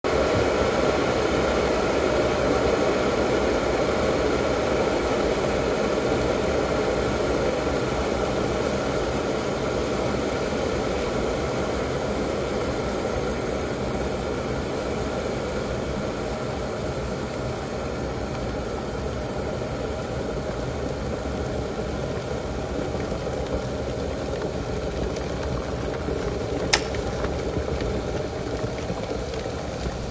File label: Kettle